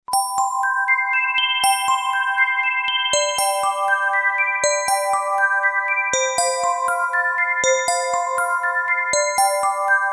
MUSIC BOX

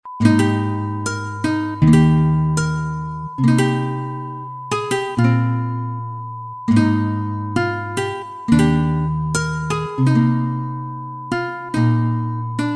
air groove

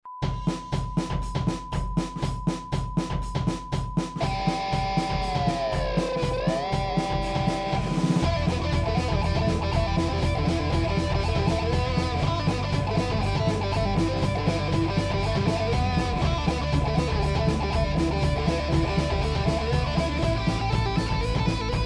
music on guitar

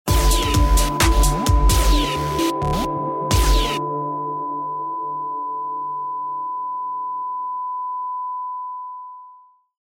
Jingle

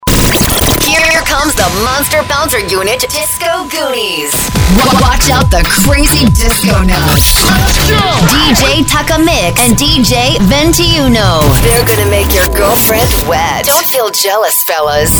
Opening Jingle; MIXCD MIXTAPE用オリジナルジングル制作

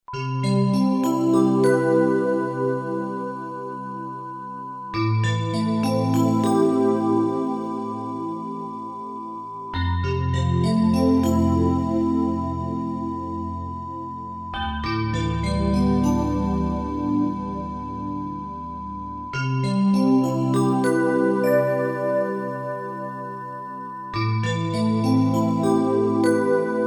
MUSIC BOX

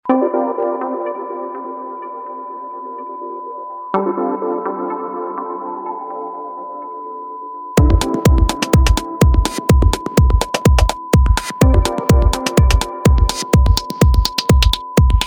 air groove

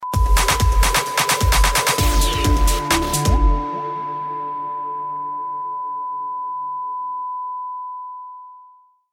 Jingle

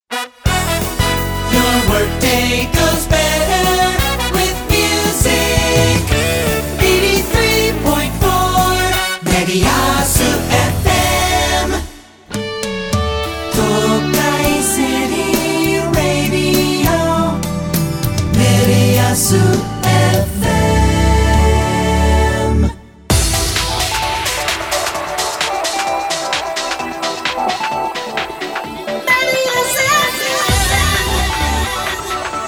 Music Jingle, FMジングル; mediasFM

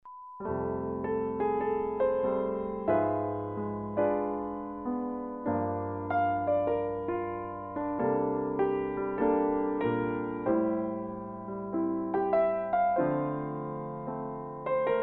artless piano

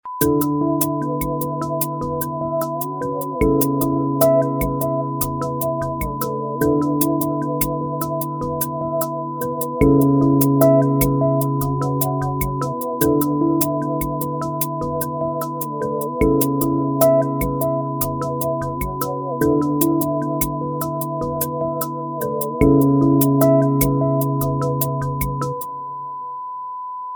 air groove

